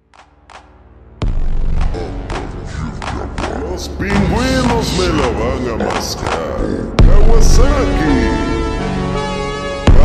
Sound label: slowed reverb